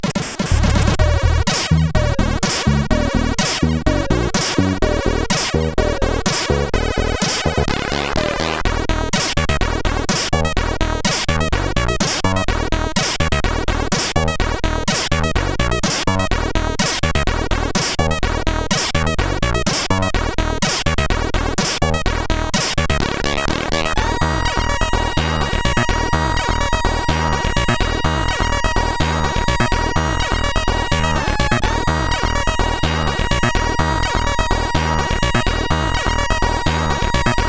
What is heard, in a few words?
Elektro Polka; hvsc